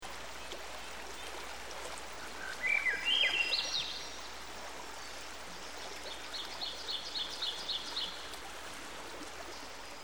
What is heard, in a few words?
Ovenbird; Dan Gibson; Songbirds By The Stream